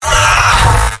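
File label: pain severe, male; tf2; garrysmod; mvm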